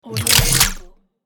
scifi gun reload

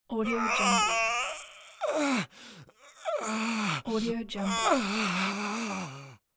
male horrified painful scream

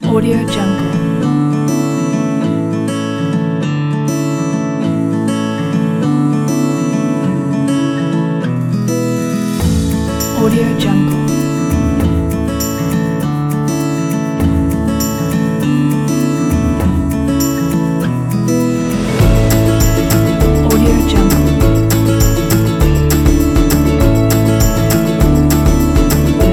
indie folk pack; silverdolphinsounds

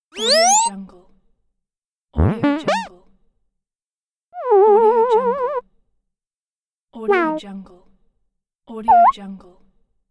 humor game effects

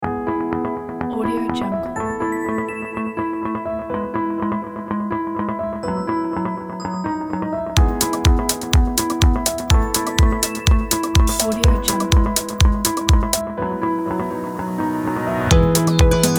inspiring classic modern upbeat

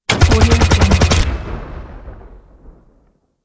machine gun

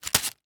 paper wrapper set down

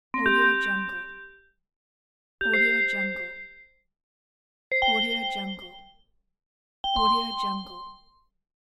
warning

